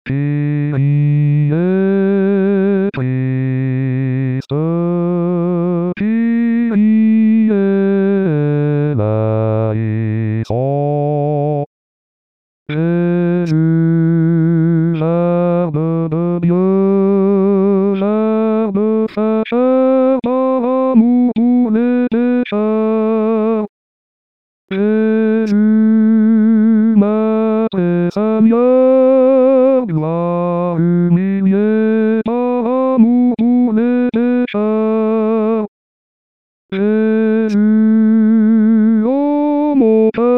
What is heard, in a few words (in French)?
Jesus Verbe de Dieu Basse